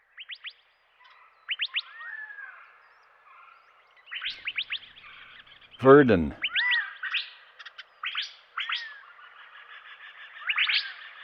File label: Verdin; Western North America; Dan Gibson; Wildlife Identification By Sound